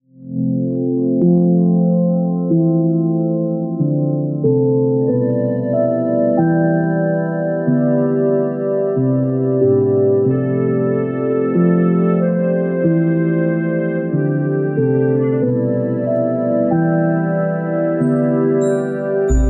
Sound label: snow; minecraft